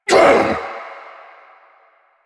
Pain Grunt; Warframe; Quests; Jade Shadows

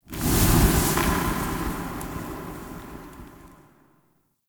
Champ Crypt Soft Flame Burst; Ambience; One Offs; Champion Crypt

